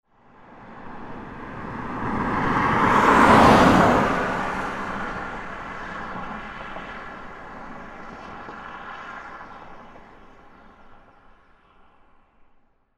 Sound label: passing car